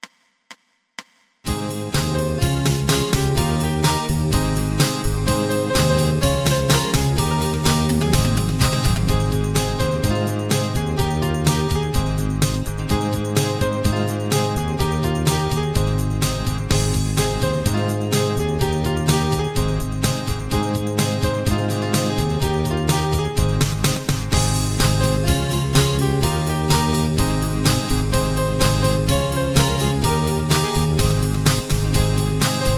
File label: High Key